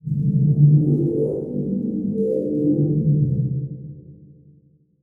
Title Transition Stab Dark Short; Production Element

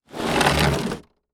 Impact Wood Door Dragged Across Cement Floor

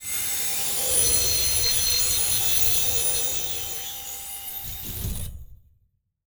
Title Transition Communication Distortion Radio; Production Element Title Transition

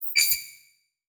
Transition Metallic Hit

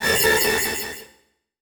Multimedia Internet CD-Rom Flash Animation

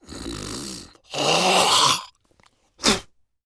Human Male Spitting

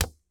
Sports Beach Ball Hit Ball

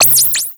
Digital; Multimedia Internet CD-Rom Flash

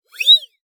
Multimedia Internet CD-Rom Flash Wipe Cartoon

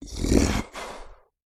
Animal Mammal Carnivore Cat Spotted Leopard Snarl; animals; AdobeCC

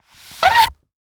Weapon Nightstick Pulled From Holster; AdobeCC

